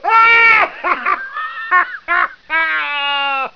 evil laughs

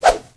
nanosword swing; ragemap2025; fournines

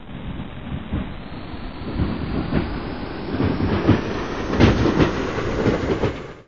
train start

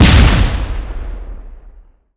flashbang

